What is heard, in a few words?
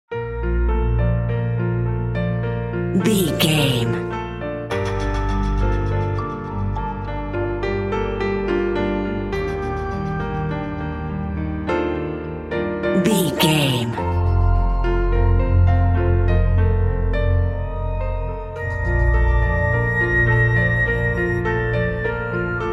ancient temple ruins